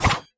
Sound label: Attack Jump; bloom minigame